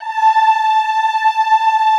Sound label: OHH A4, Classical Choir; Best Service; Extended Classical Choir